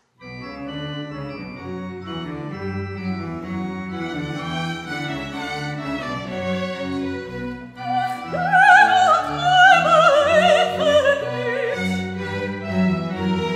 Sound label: soprano sample